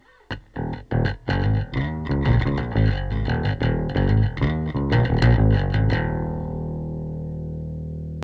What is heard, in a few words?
bass; Valves; Dust Factory